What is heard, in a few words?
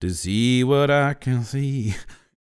DELTA, BLUES